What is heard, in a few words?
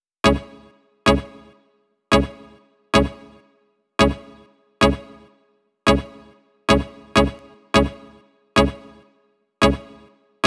Dirty Dutch Kit Melody; Mutekki Media; Vengeance Electroshock Vol.2